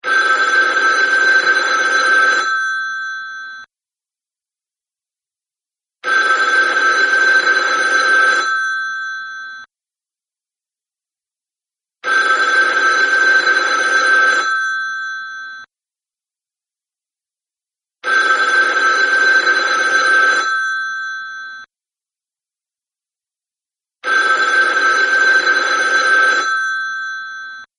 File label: central office ring